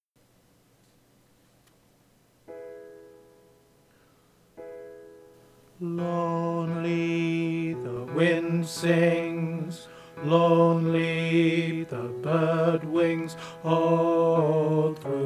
bass sung

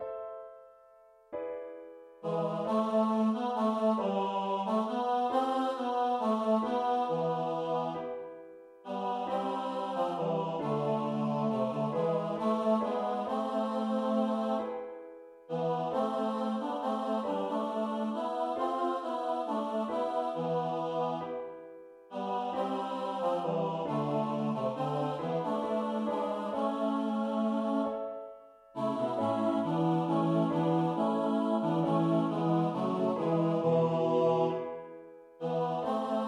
alto

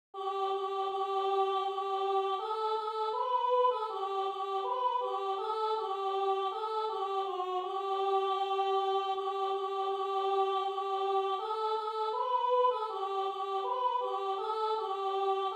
soprano

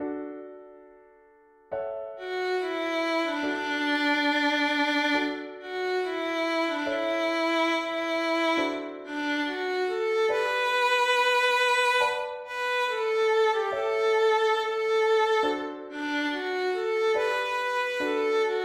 all inst

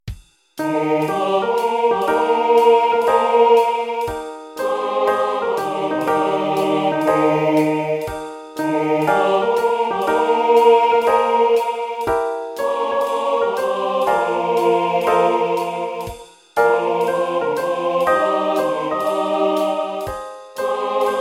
swing all parts no vocals

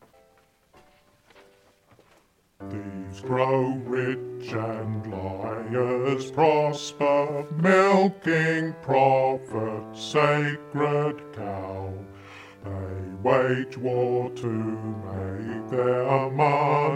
bass vocals

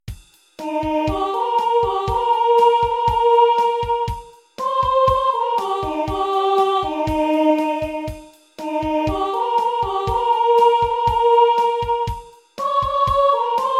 short simple melody soprano

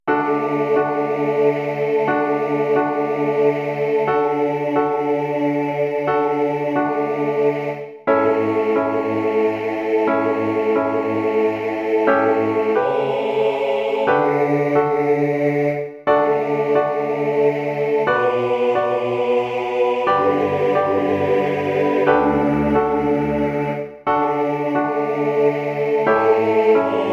we are building all parts

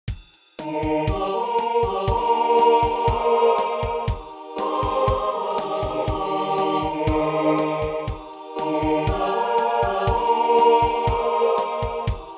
all parts drums

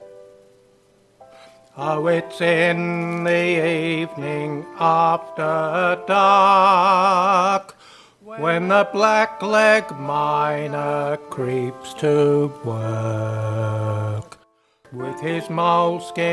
baritone melody sung; blackleg miner

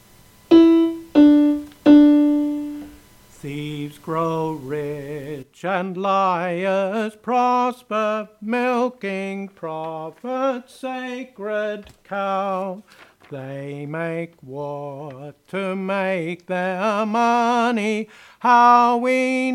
melody sung

